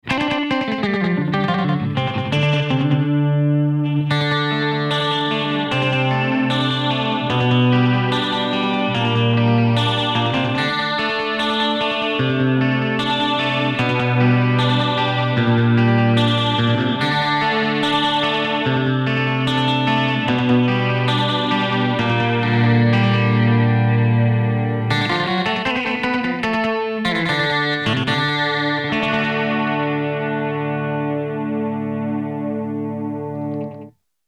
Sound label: Wide Bouncy Slap; Strymon Riverside → Strymon Iridium → Strymon Deco; Tape